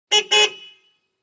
Montero Horn 2 Beeps, 1998 mitsubishi montero ext.